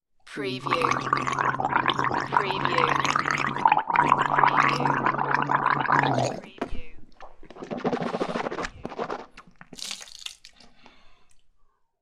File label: Gargling